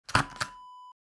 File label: hole punch